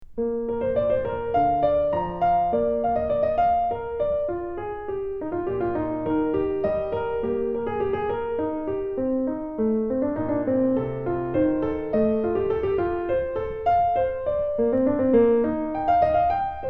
Invention Piano; Bach

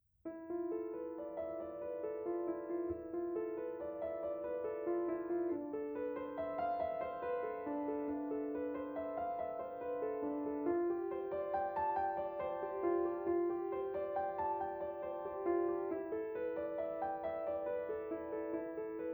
airy fairy; piano

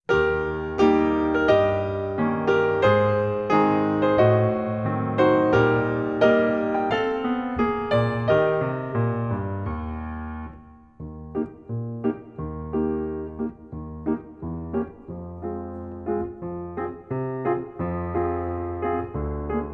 Piano Accompaniment; essential elements

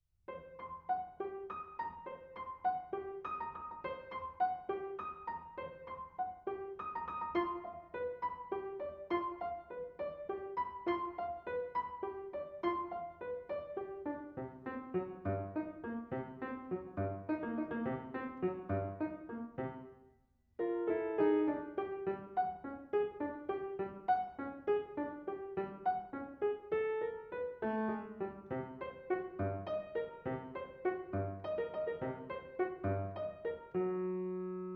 dripping fairy, piano

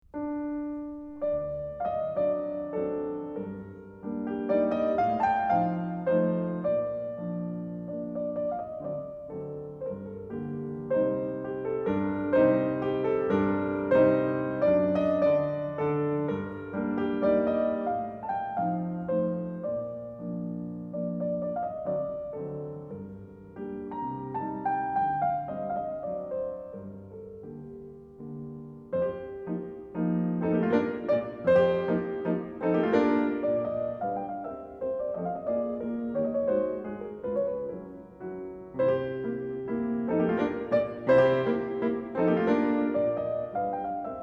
Mazurka In G Minor, piano; Chopin; Mazurka 43; Op. 67 2, CT 93

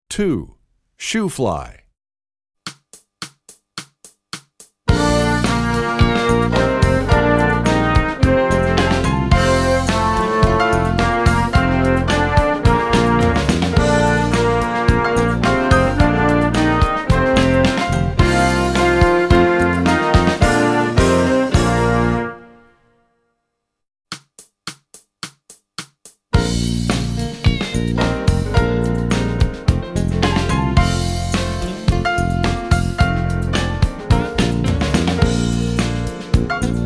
trombone; Shoo Fly; essential elements

